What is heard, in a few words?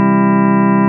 synth sample